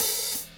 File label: Hihat Open